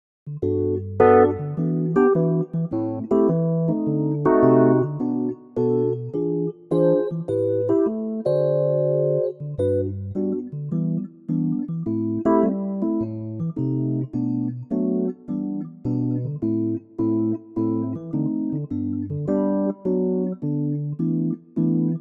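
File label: electric piano G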